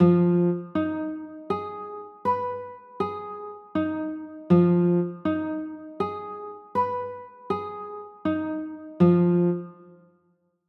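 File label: F m7b5 chord, guitar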